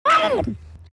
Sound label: Cute Grunt